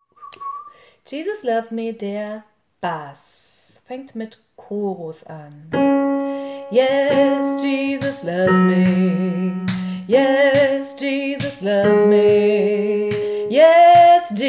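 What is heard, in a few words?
Jesus loves me bass, gospel choir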